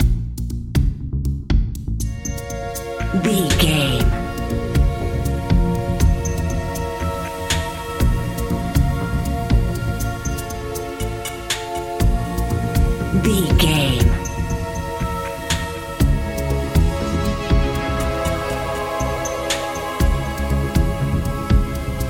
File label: mysterious video game expedition narrative